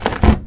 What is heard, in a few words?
cardboard box break; physics